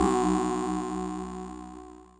Robot

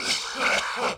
Cough bad

